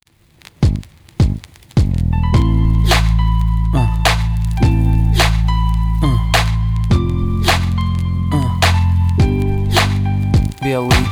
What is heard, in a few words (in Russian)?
gitara